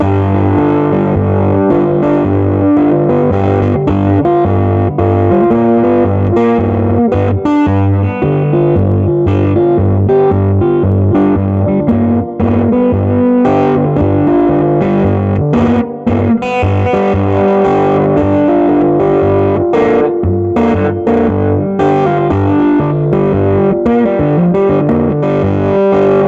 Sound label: Rag in C; swampdonkeyamps